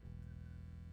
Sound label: bass takes, Recorded